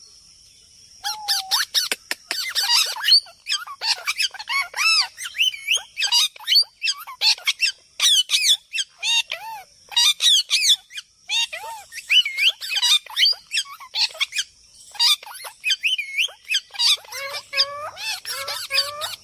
Parrot Sound

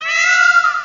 Peacock SMS Ringtone

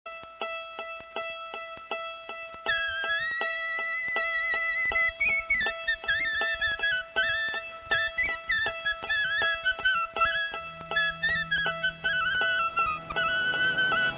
Flute Mast Ringtones

